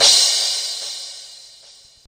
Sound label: crash; FL Studio; xander brown drumkit